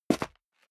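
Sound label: step leather shoes concrete grit single left, footsteps; schritte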